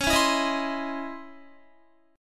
C sharp Minor Major 9th, Guitar; C#mM9; strummed; JGuitar

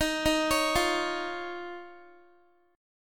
Eb 7th Suspended 2nd, Guitar; arpeggiated; x,18,15,18,17,x

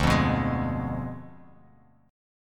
D Diminished 7th D down Bass Standard; strummed

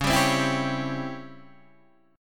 C sharp Minor Major 7th Flat 5th, Guitar, Open D

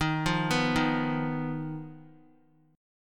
D sharp Suspended 2nd Sharp 5th D sharp Guitar; arpeggiated

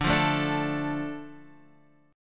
D Minor D Guitar Half Step Down

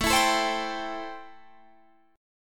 A-Minor 7th A; 17,19,19,17,20,20; strummed; Am7

